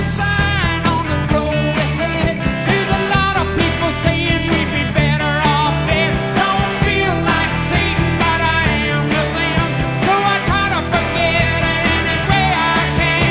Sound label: rock